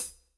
natural pedal hi hat single hit f key, Royality free pedal hi-hat sound tuned to the F; hat samples